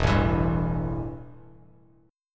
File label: E Minor Double Flat 5th E, Bass Standard; strummed; JGuitar